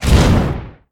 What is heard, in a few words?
dash